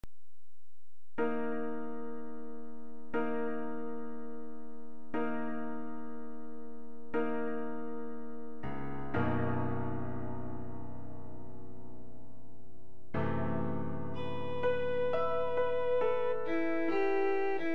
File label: piano trio beginning